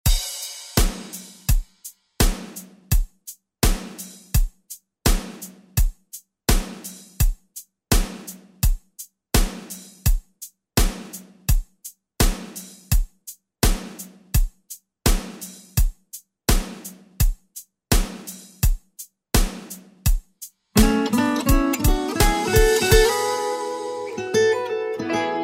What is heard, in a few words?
Spanish Version ReDrum; DJ Mhark